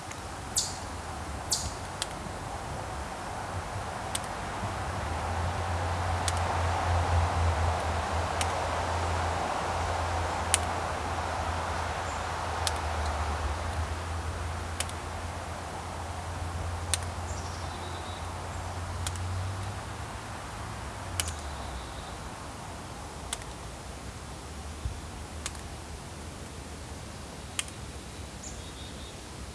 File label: outdoors